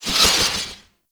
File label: Spear Gun Whizzby; Hoplite